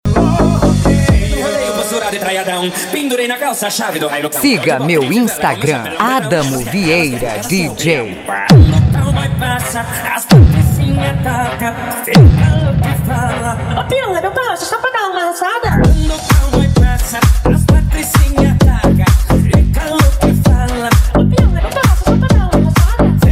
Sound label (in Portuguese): agro country hitmado mega funk